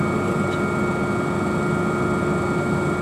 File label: helicopter interior flying loop; stormwaveaudio